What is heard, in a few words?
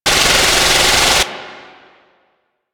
Ship Turret Projectile; DE; Warframe; Weapons; Archwing; Fomorian Ship